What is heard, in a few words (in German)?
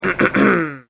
raeuspern